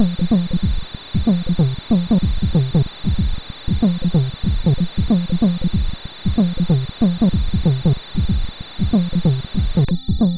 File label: Snakepit end, MOS6581R2